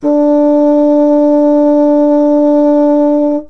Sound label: bassoon d4; Orchestral